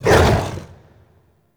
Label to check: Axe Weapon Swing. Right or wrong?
Right